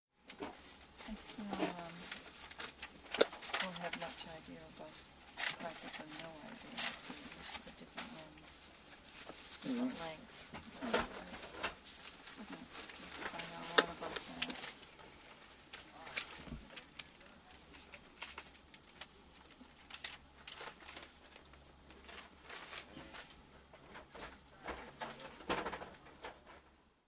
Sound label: Int Pass Walla Paper